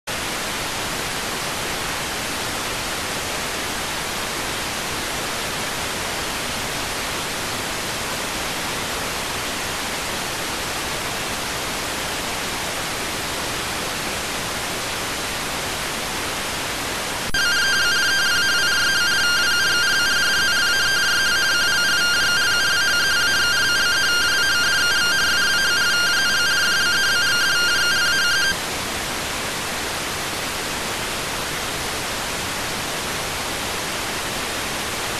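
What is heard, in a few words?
radioteletype receive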